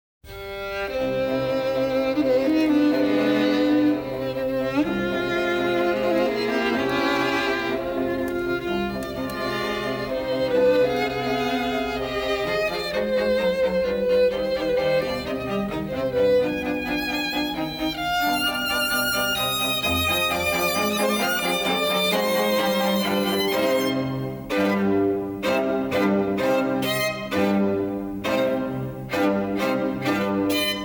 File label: strings; beethoven; emastered loud